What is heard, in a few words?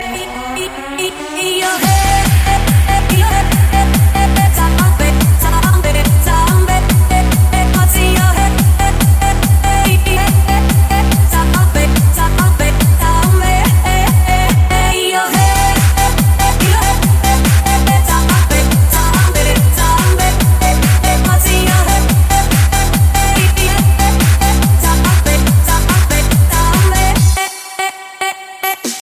mix iphone 6s ringtone